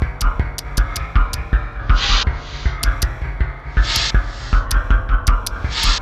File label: 80 LP, Industrial Loops; RISK